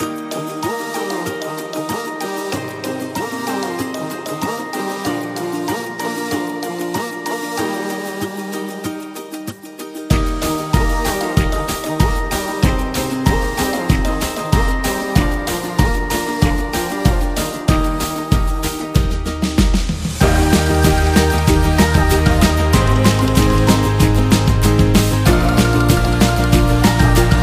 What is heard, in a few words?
Happy Background